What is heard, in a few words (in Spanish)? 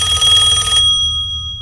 telefono